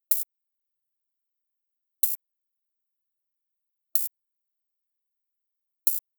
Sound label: Zippel Beat, dance